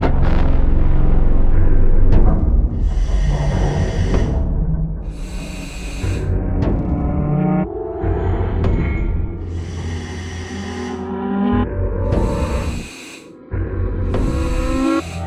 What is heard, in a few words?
Theme